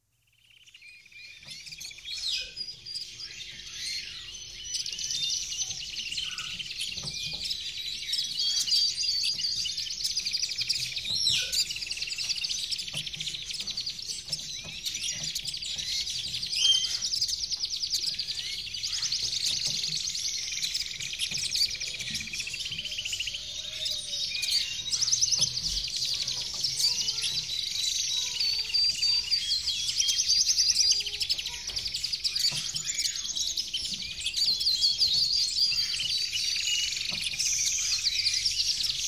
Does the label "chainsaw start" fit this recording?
No